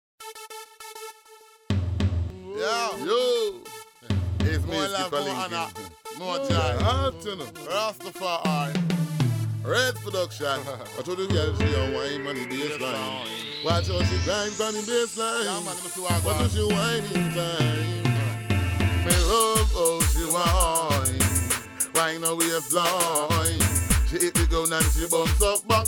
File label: Bassline